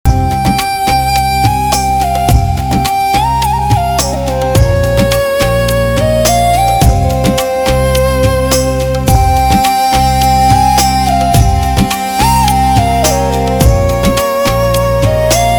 indian melody ringtone

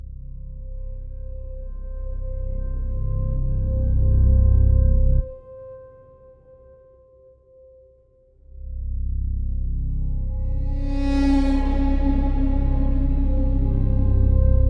HORROR, background music

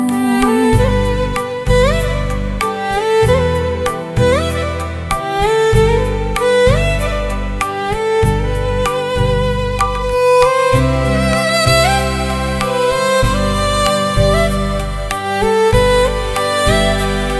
indian melody ringtone

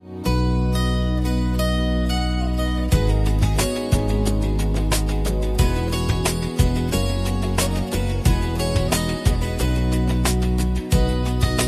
background music

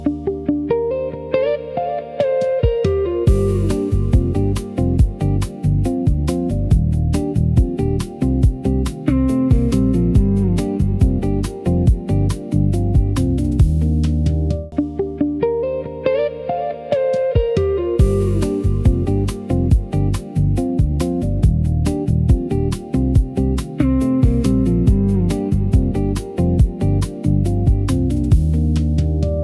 happy vibes ringtone

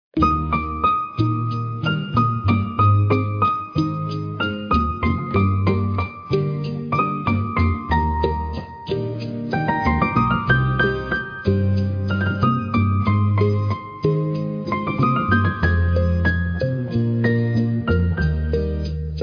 piano ringtone

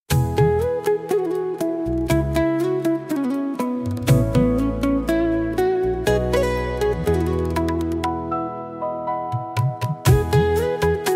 jhilmil ringtone

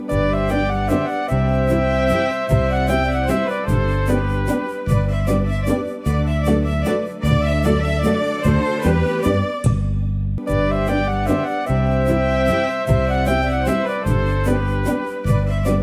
free ring tone, indian melody

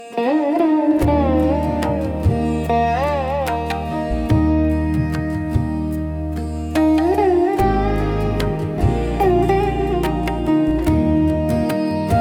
free ring tone, indian melody

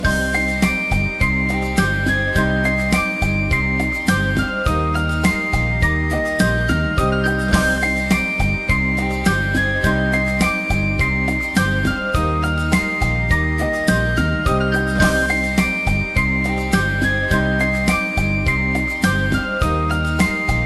indian melody ringtone

